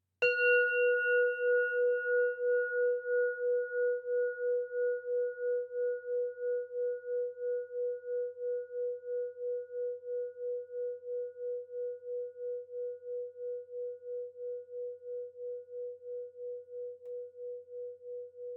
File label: Small Temple Gong